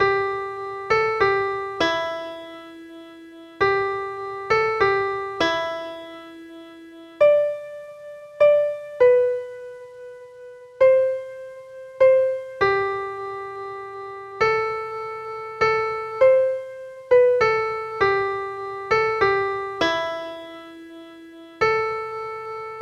Piano Game Silent Night

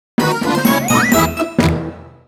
Jingle, Stage Clear; Pig Medal; Wii U

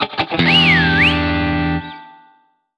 Jingle Opening; Clubhouse Games: 51 Worldwide Classics

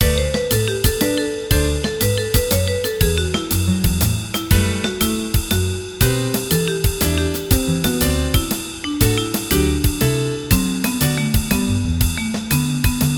Win game; Vidiot

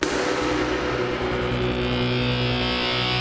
Combat START, soundtracks

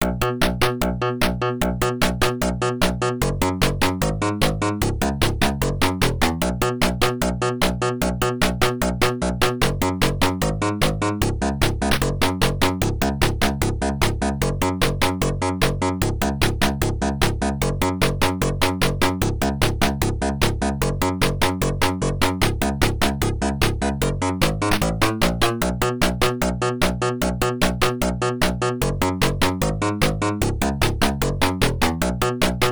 Stage BGM B (No Lead); Wise Guy; arcade

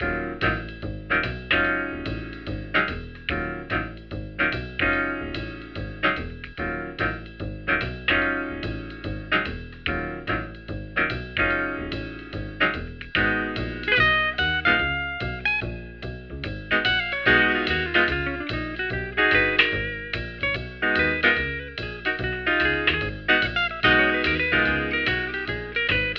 Jazz; alien earth

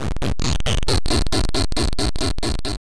Laugh; Nikolai

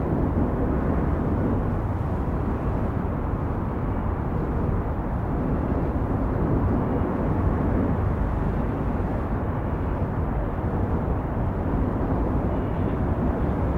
Wind

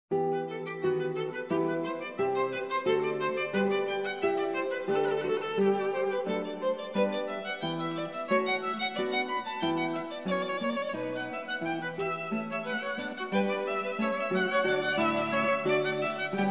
Trio Sonata in E minor Prestissimo

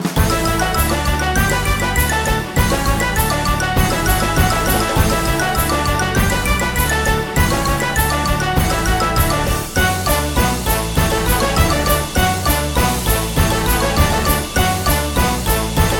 Oldtune; lemmings psp 2006

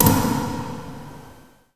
Land; tempest 4000

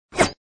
Basic Attack; LEGO Ninjago Spinjitzu Smash

